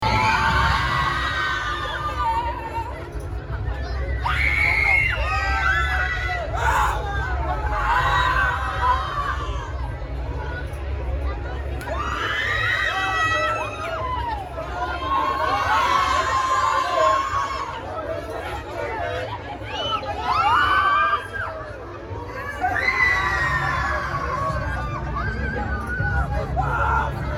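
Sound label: amusement park swings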